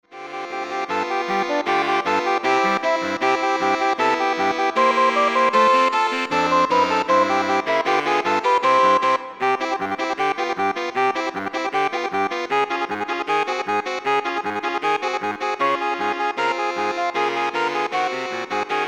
Saxophone Ensemble

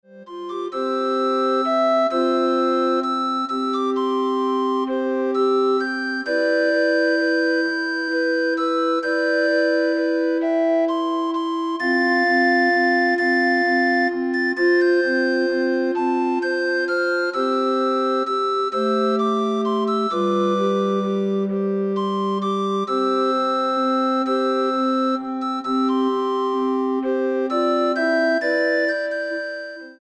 Recorder Ensemble

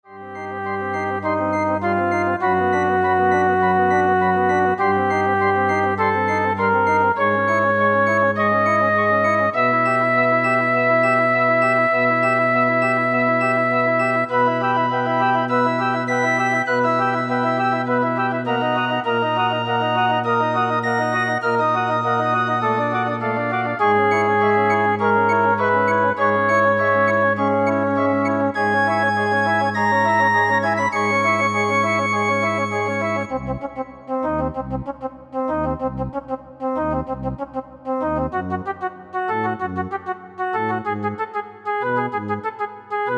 Flute Choir

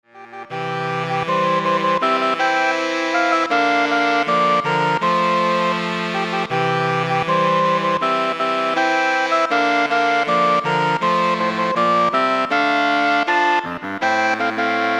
Saxophone Ensemble

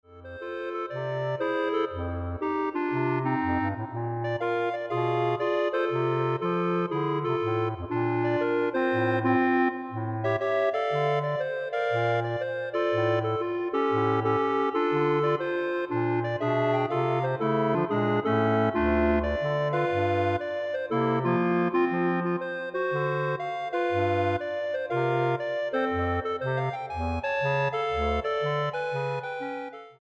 Clarinet Ensemble

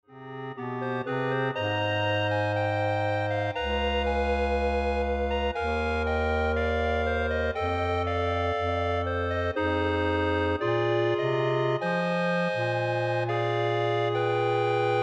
Clarinet Ensemble

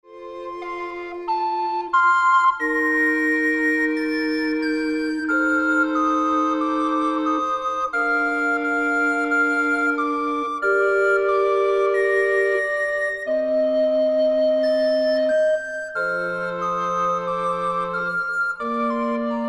Recorder Ensemble

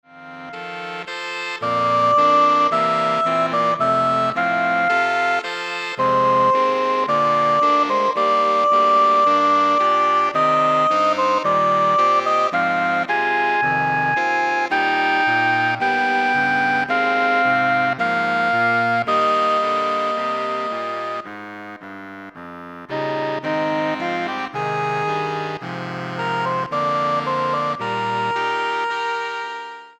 Saxophone Ensemble

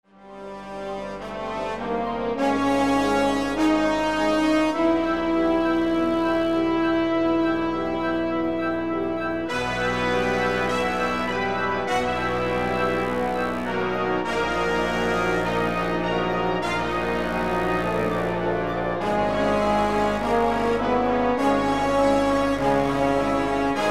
Concert Band

